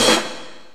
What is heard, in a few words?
HH, Dance Mega Drums; Best Service; HH HOU